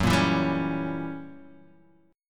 Gb 7th Flat 9th Gb Guitar Open D

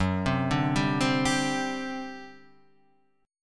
G Major 7th Suspended 4th F sharp

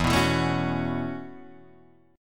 B 7th Suspended 2nd Suspended 4th, chord

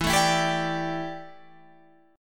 D Suspended 2nd Suspended 4th E

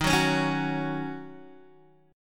D sharp Minor 7th, Guitar, DADGAD

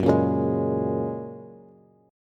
Gb Major Add 9th, down, Bass; strummed; JGuitar

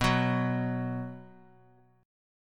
B 5th B, chord; strummed; x,x,9,11; JGuitar

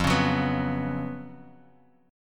Gb Major 7th Suspended 4th F

